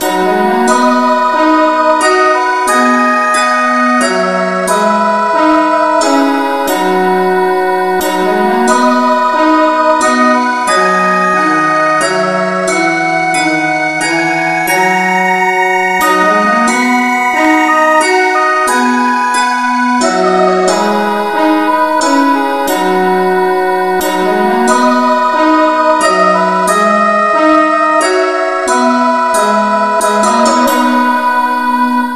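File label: no vocal, keyboard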